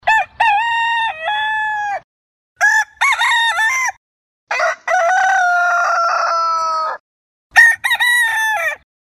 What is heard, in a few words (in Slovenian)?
PETELIN; Efekti